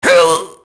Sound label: mobster get hit; Max Payne